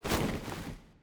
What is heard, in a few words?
Monster; Zenless Zone Zero